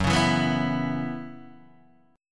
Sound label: F sharp 7th Suspended 4th, Guitar, DADGAD